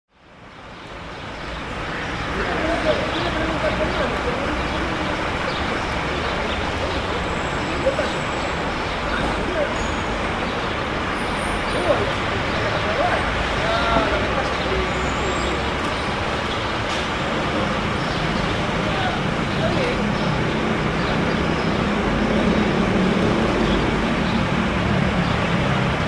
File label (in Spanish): plazas; pringles